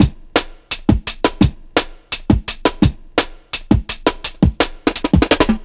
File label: Swift Beat, Drum & Bass, Beats & Breaks; Fluid Dynamics